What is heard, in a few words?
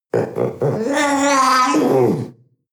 panther young vocal yowl